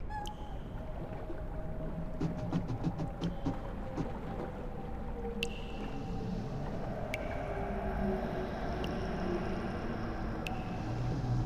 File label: Sea Below Deck Center